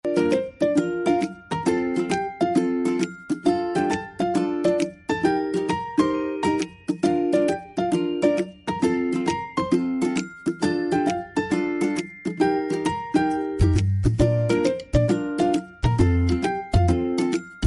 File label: game BGM